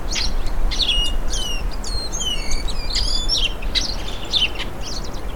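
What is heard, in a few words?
Agelaioides badius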